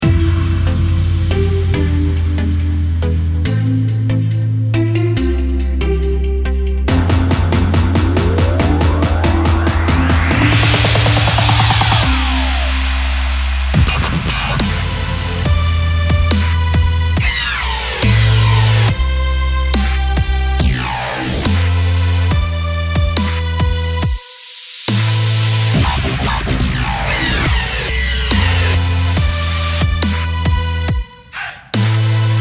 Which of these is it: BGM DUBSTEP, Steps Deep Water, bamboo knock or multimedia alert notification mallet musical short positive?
BGM DUBSTEP